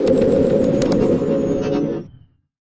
Data Text Appear Low, stinger

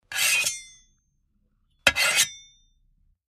Spatula Long Scrape, kitchens

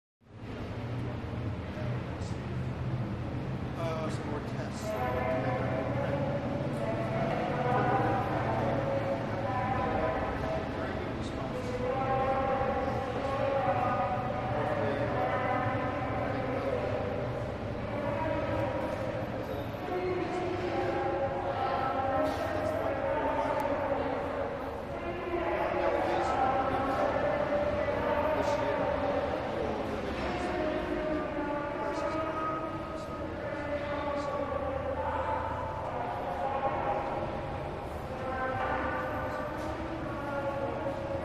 Train Station Amb

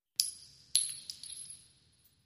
Shell Casings Single; shell casing movement

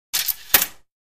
Billiards Sliding

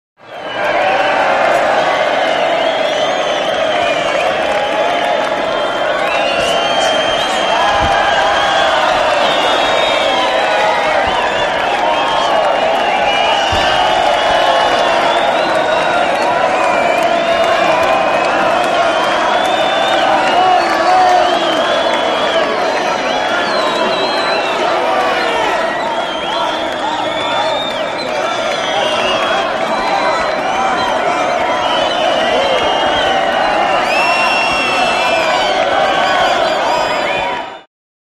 Boxing Crowd Cheers

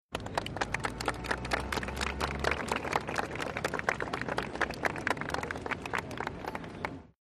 100 People Applause O; medium

